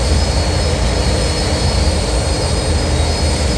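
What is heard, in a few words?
hover loop; movers objects; raven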